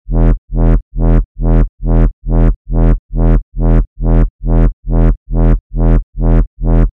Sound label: Reverse Bass Loop E (138 BPM)